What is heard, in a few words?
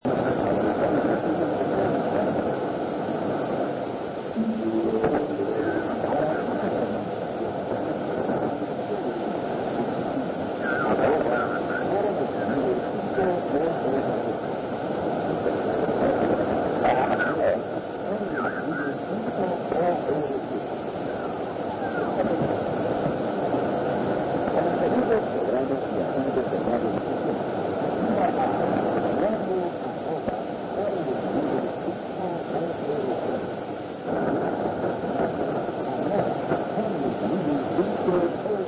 5054.60kHz; Faro del Caribe